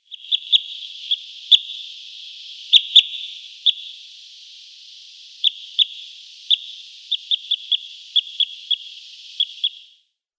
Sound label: Parrot Crossbill calls